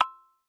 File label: complete